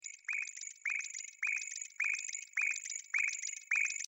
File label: cricket sound; animals